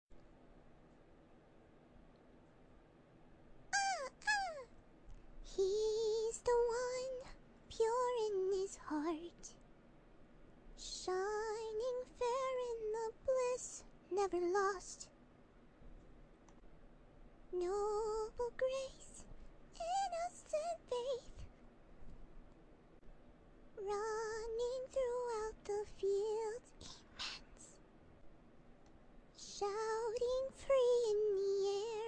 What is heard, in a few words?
Singing; Fluttershy